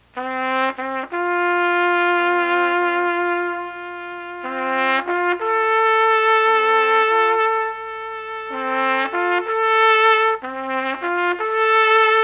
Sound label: Taps